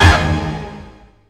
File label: STAB